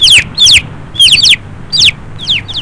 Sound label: Fledgelings chirping